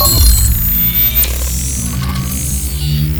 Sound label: gauss precision start; doom gauss